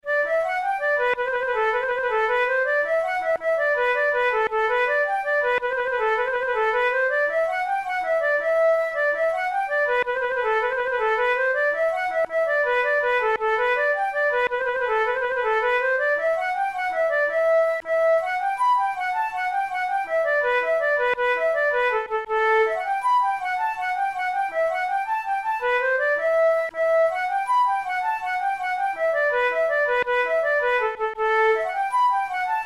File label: jig, flute